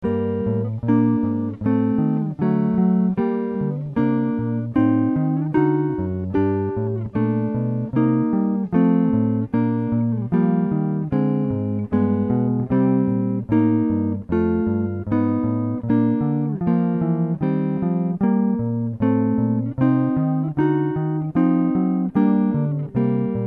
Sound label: chord